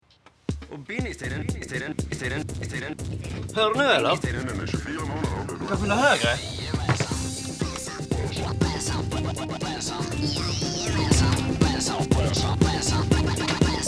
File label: Ringtone